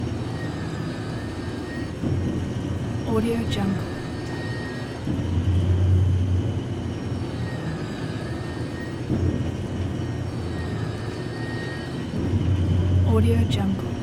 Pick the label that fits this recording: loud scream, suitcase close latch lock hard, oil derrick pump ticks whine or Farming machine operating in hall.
oil derrick pump ticks whine